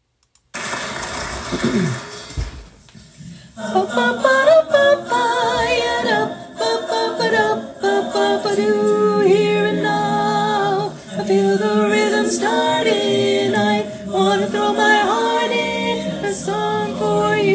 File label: tenor prominent